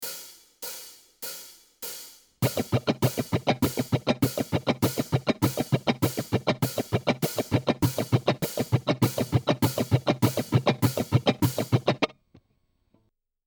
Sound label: strumming cutting basic